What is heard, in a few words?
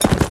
horse gallop; Mount&Blade Warband